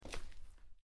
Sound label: Land